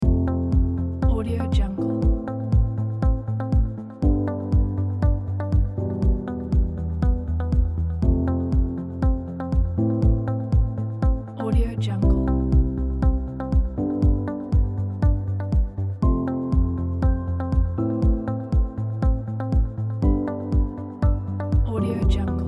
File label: ambient voiceover background loop; bdproductions